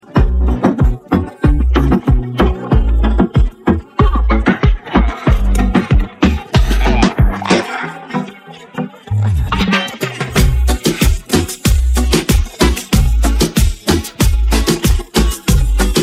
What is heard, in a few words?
instrumental